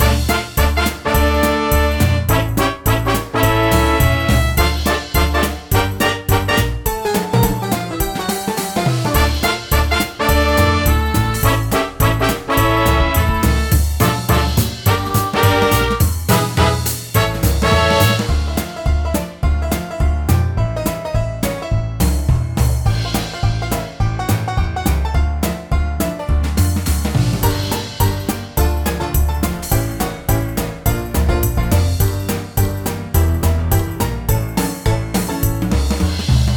LOOP Mission; Adventure Land

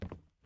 plastic step

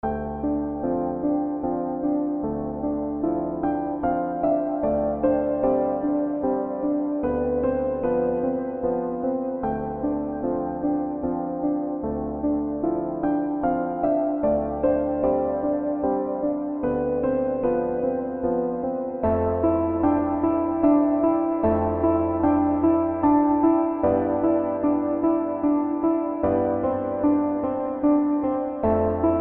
game music; Orbit; Wii U

